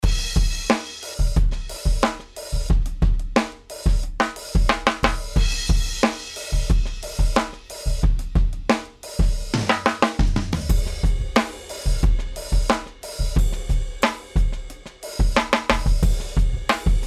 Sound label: EDM 90bpm